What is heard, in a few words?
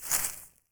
Footstep Grass Light